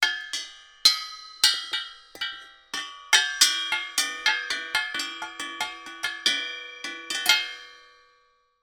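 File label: industrial gas canisters x2 large small hits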